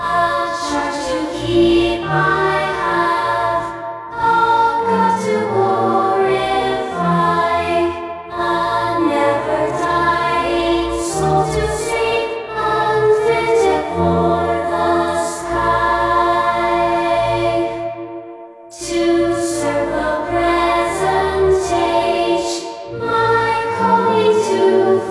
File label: choir